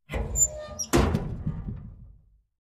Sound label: Cabinet Door Open